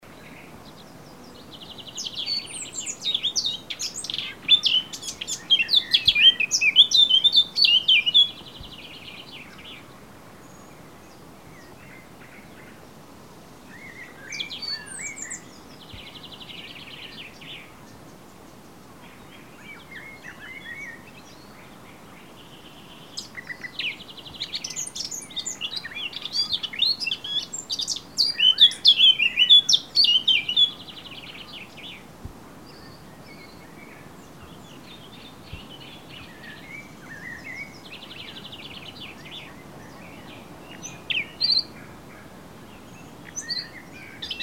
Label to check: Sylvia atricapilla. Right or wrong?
Right